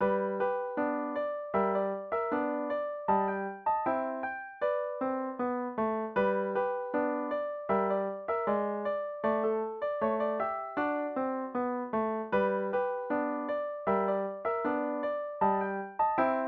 piano

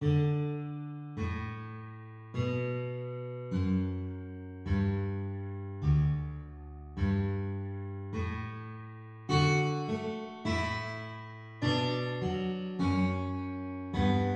canon in d guitar

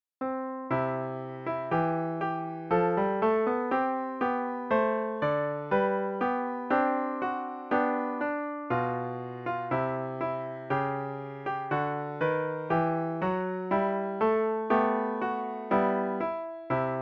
piano

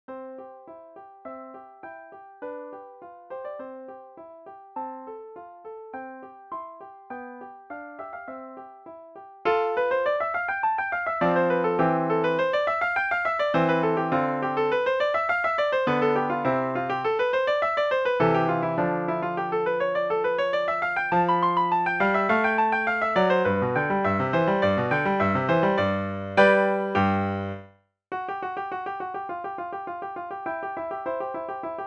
sonata in c major piano

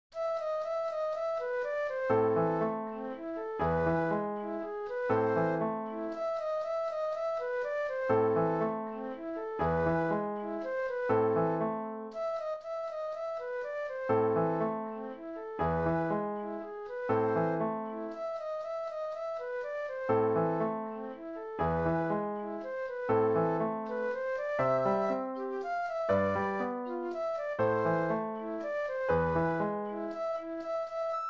flute solo; fur elise

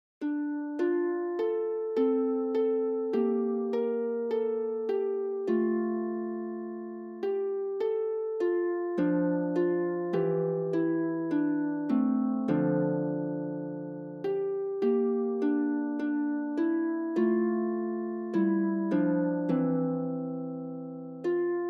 harp

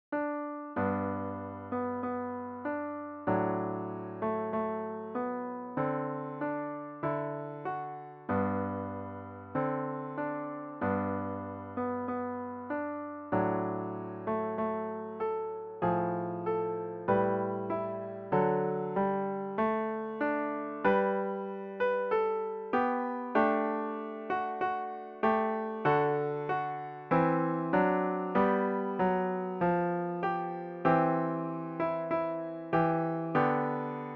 piano

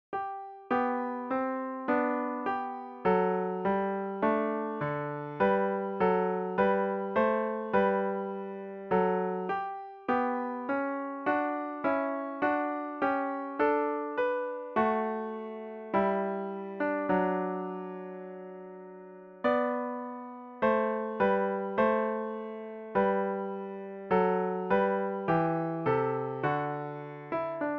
piano solo

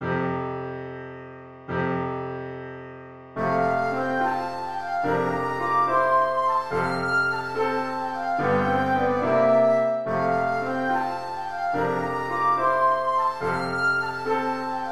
dance flute

